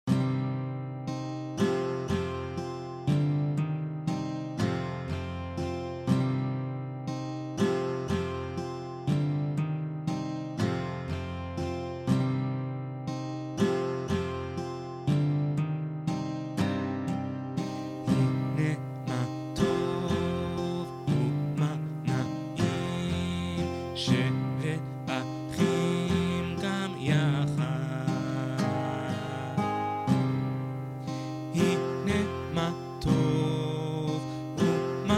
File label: hineh ma tov voice